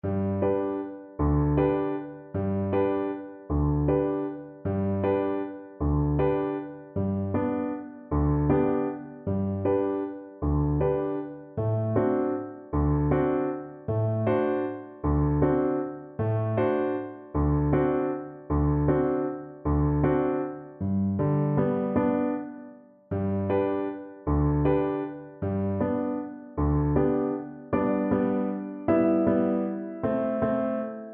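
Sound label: viola karaoke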